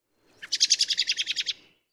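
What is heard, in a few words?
American Robin whinny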